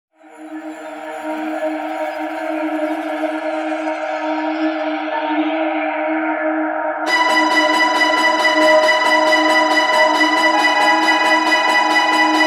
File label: suspense, Music Loops; Music Loops Pack Bundle